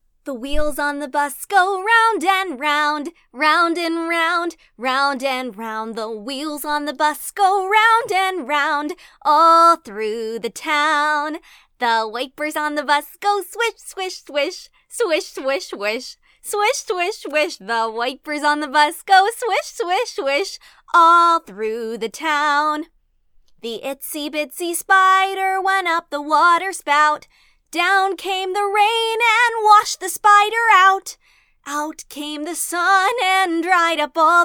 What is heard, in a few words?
Children Singing Nursery Rhymes